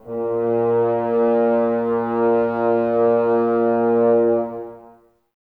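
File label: Brass French Horns, Woodwind Brass Ensemble; Sample CDs; Miroslav Vitous; F.Horns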